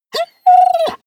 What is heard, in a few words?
dog whine trill; natur-tiere; hunde